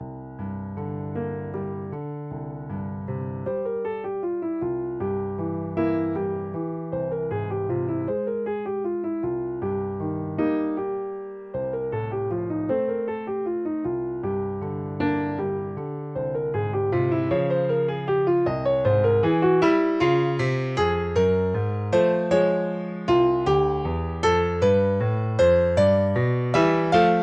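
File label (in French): prelude pour piano majeur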